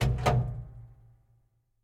Clapet

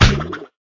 Tap ducky; Clickergame

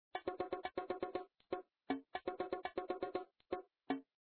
Intro Drums

